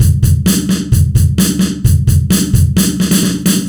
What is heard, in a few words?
loop